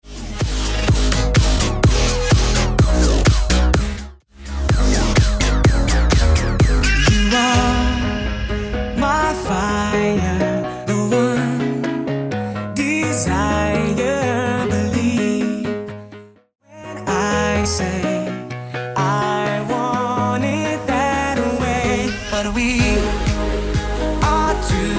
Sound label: Bootleg Smashup 126bpm